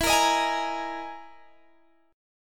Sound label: E Major 7th Flat 5th, Guitar, Open G